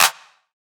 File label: Klap